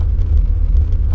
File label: dark engine room loop; LOOPING AMBIENCE